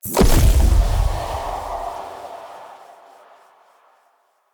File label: Corruption Grip Blast; Characters; Enemy; Lady Deora; Abilities